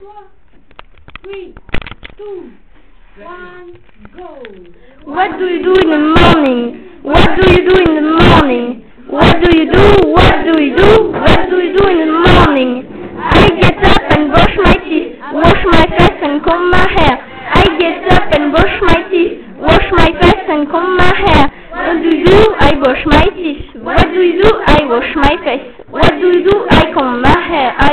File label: song original chant